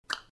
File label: Cluck